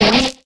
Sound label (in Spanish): Grito de Nincada